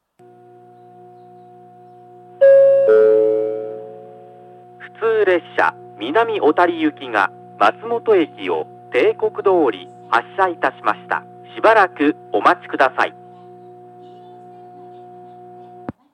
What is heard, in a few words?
kita matsumoto 2 bannsenn matsumoto hassha; ２番線松本駅発車案内放送; 普通南小谷行の放送です。; 大糸線; 信濃大町・南小谷方面